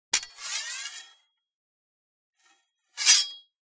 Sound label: The sword is taken out of its scabbard; cold weapons